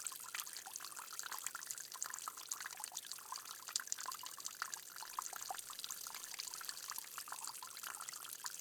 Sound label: water tiny fountain; ott3d